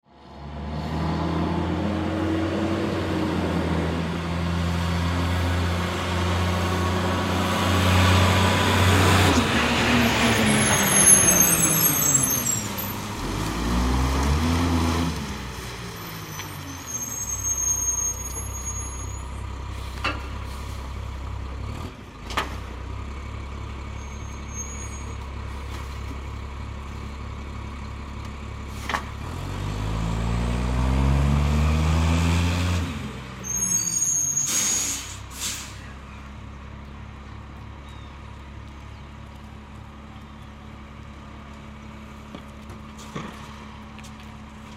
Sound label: Garbage Truck Ambience Pick Up Trash Urban Neighborhood; Industry; Blastwave